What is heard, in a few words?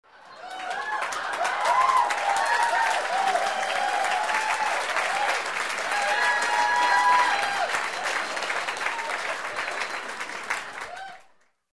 Loud applause in a small room; standing ovation